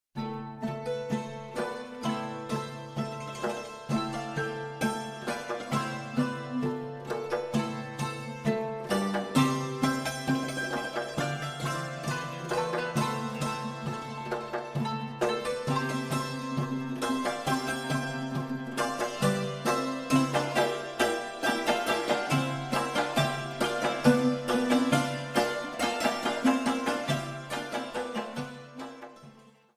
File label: Ancient Greek Dance Instrumental